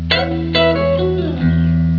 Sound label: YELLED